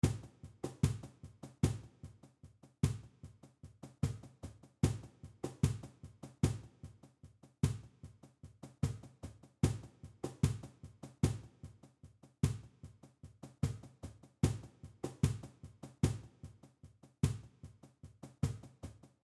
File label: Siguiriya 150, flamenco